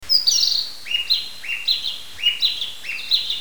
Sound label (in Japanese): kibitaki